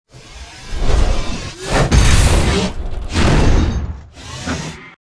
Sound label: melee backhand; Titanfall 2